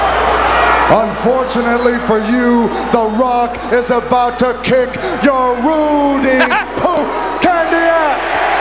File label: rock